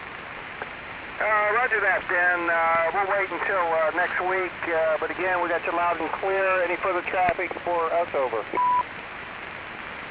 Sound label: MICOM PTT RELEASE TONE, MICOM-2/MICOM-3, 1000Hz PTT RELEASE TONE